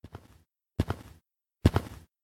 Steps Left ENTER; Five Nights at Candys

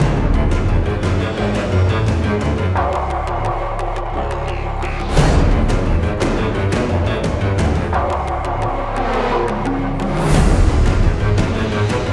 Loop; Rage; Rednoteaudio; Jackal Valley Level 2

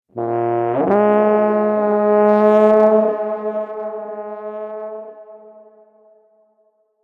Battle Horn; Middle-earth Shadow of Mordor

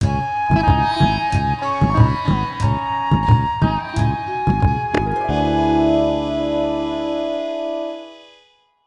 Bari Sax

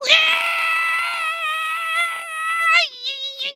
scream sqeezed; Scrat

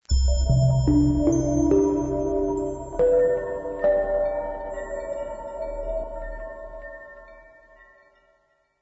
Music Theme

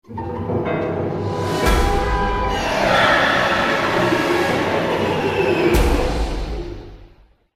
grunt chainlink; quake 4

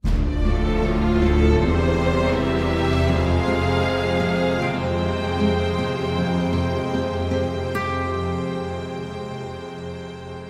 Bell Puzzle Solve; uncharted drakes fortune ps4 gamerip 2015

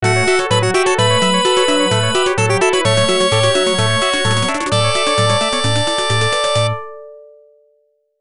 Unused Jingle; Pollux; arcade

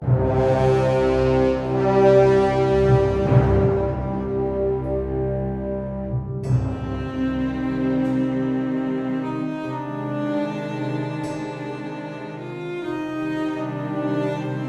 Music stinger

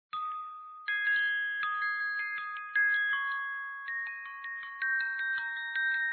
Red Chimes; Flower Song Fun Online

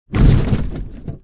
Metal Hit Large; monster truck destruction; android

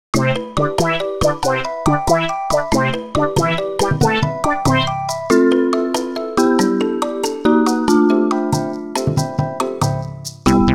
Comical Slow

